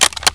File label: M3A1 Cov, weapons; dod